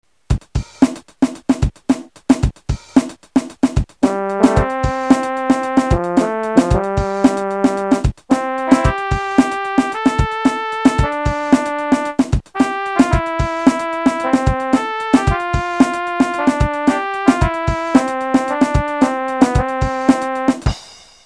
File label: seal funk; Гимн Тюленей